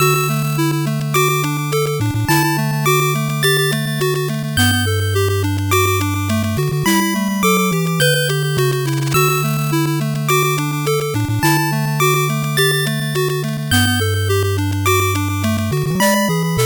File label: 8bit bgm fast